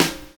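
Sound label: snare to hi-hat; Univers Sons - Kitch 70s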